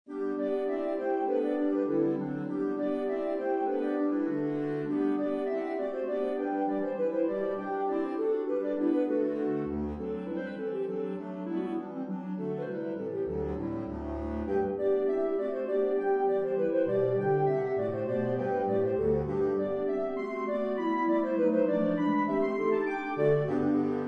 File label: Mock Morris Clarinet Ensemble; Grainger